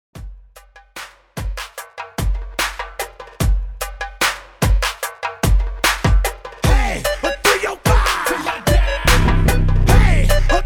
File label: Party Break 74 BPM